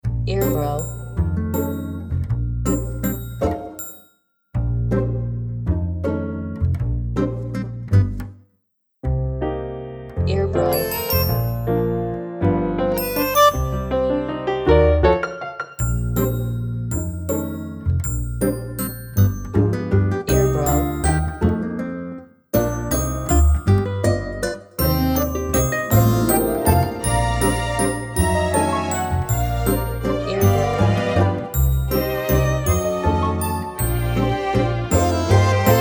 Instrumental

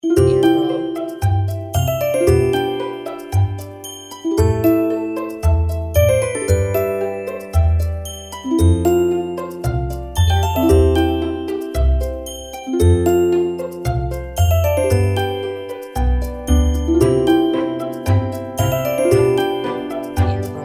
Warm Thanks

